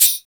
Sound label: SHAKE BAKE; TEK PERC2